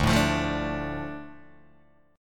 D Minor, Guitar, Open D

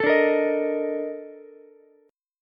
A sharp Major 11th Ukulele, chord; A#M11; strummed; JGuitar

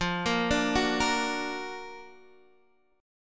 F sharp Augmented Major 7th F

